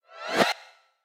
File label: Matchend Whoosh; boomerang fu